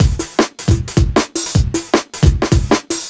155 bpm full, drum loops; Zero-G; Soundclash Nu Dance Electro; Acidized Wavs; Left of Center

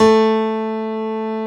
GRAND A2; Analogon

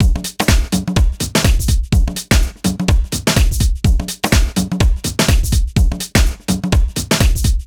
Full Loop 125, Loungin House; Equipped Music

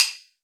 Shu Ban, Roland BK-5 Percussion

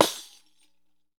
req slap; Deff Bendir &Req

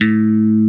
ELECT BASS, pick bass

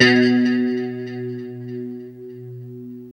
LO E B 2, Guitars; Spectrasonics; Hans Zimmer Guitars VOL-1; JC-SHEEN

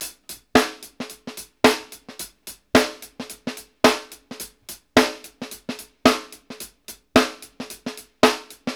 HAT+SNR; Sampleheads - New York City Drumworks VOL-1

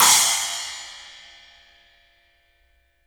CYMBALS; 3D Sound; 3D Drums

